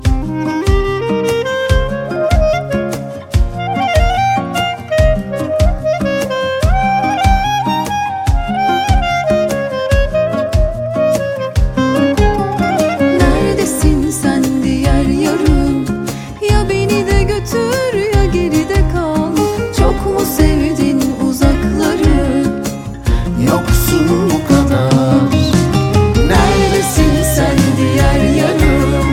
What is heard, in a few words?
Ringtone Mobile